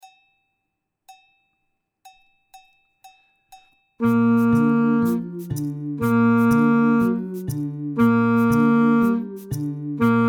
saxophone; mib

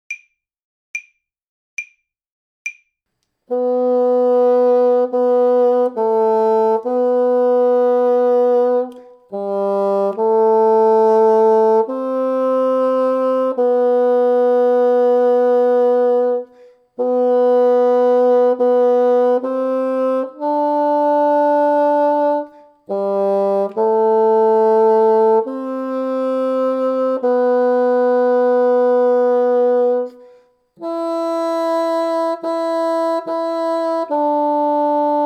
poeme 3 bassons, basson; poeme trio

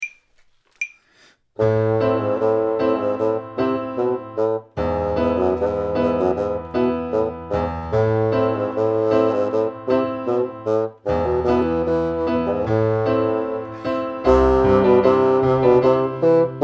minka basson

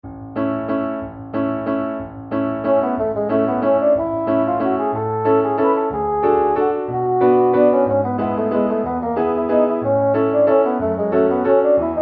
berlioz basson rapide

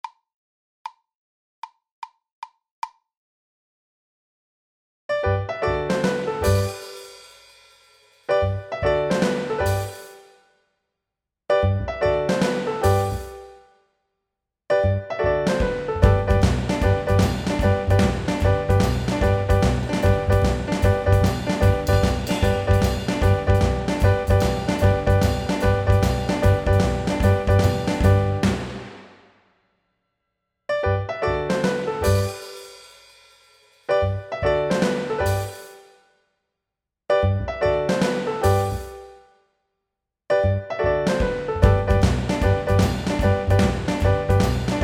basson; methode basson

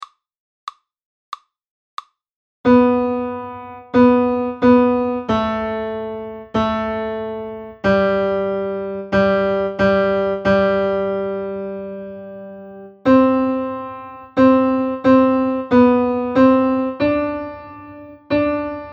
hymn voix 3, saxophone; hymn trio

